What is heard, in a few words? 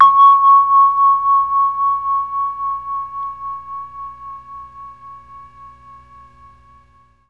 C#4 VIBES, Mellotron MKII; Mike Pinder - Mellotron Archive CD